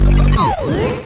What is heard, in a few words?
Electro FX; Ueb HE Electro FX